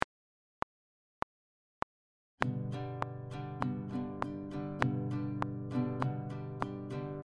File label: Easy strumming pattern, guitar